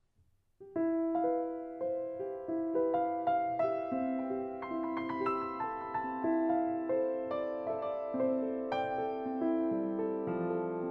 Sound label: piano